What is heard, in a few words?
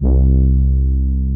SY BRASS 1B C1, SOFT BRASS; Music Store - Claudius Diemeter Schwerlos